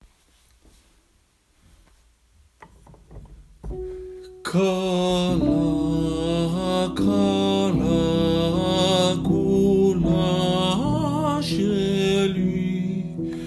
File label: Hebrew Love Songs Bajos